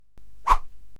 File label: Woosh